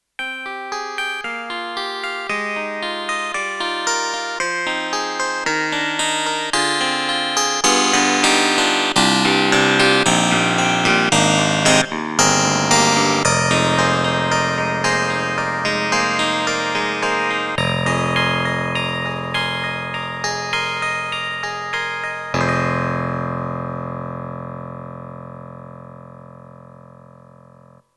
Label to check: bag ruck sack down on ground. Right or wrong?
Wrong